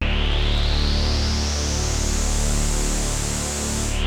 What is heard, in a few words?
KORG D2, Rare Synthesizer; Hollywood Edge - Giorgio Moroder Rare Synthesizer Collection